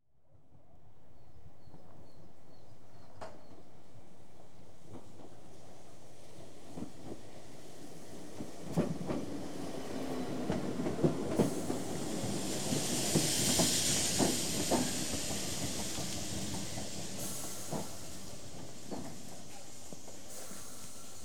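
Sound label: Trains, Midland Electric, Exterior; Transportation; BBC Sound Effects Library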